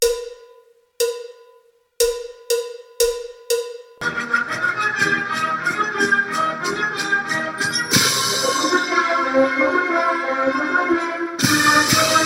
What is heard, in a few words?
Gb Key